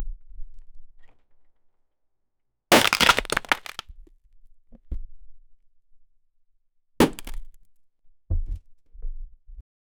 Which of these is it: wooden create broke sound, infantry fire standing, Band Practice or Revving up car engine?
wooden create broke sound